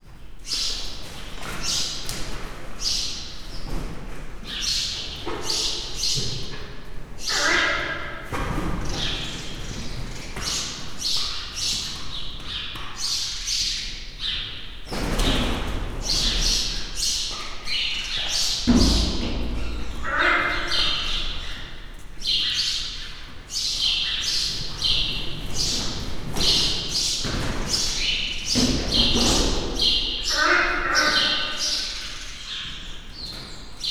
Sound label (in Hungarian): batla, rózsás gödény szárnycsapások; Hortobágyi Nemzeti Park 2013